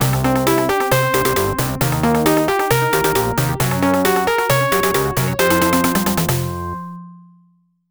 Game Start; the last day arcade

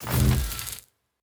Ui Place cables

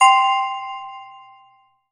Marathon Pickup

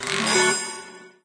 3 Star; candy crush saga gamerip

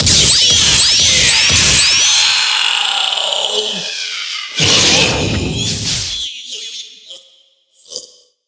Boss Dead Hihi; gamerip; super monkey ball banana blitz hd

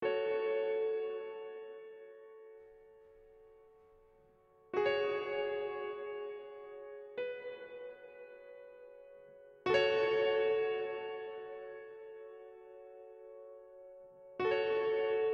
Fill

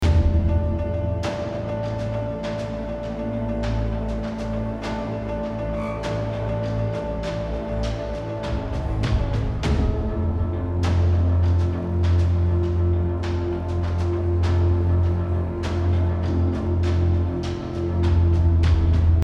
Tension Sudden Death

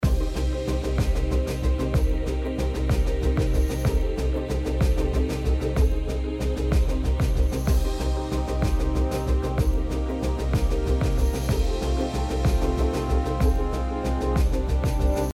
Lose Music Loop; All Guns On Deck

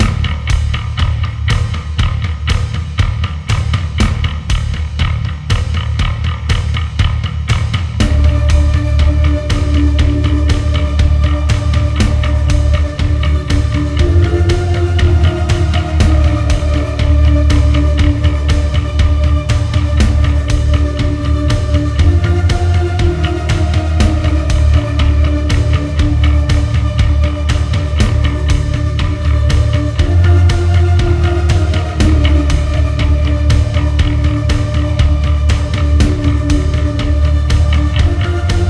Menu Music Loop

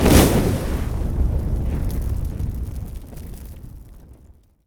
Arrow Launch Fire; Timelock VR

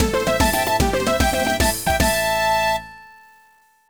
Battle Victory; tokimeki memorial forever with you; saturn

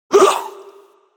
Damage (Voice); children of morta; Scorpion King

